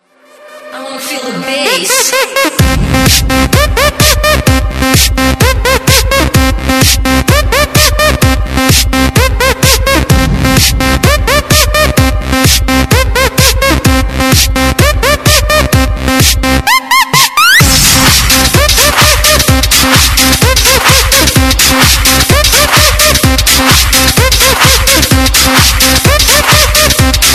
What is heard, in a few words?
club sound